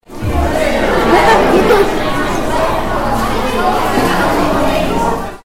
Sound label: cafeteria